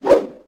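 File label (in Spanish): apuñalar fallo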